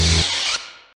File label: saber off